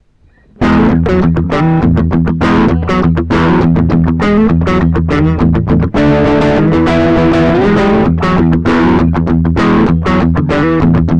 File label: grunch